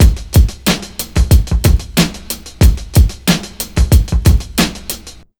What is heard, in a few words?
92 BEAT, Pure Electro, BEAT MIX; USB Soundscan vol.03; AKAI